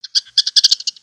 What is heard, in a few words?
Pets Skunk Happy; creatures; Lego